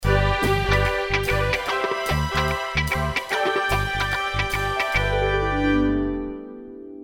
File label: Hearts loading